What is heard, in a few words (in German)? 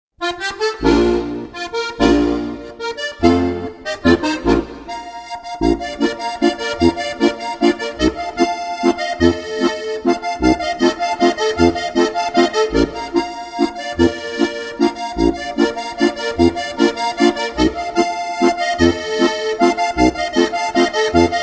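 steirischer klarinetten ländler; traditionelle Volksmusik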